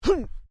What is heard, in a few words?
Male Vocalization Hun; Male vocal sounds; Exclamations; Voice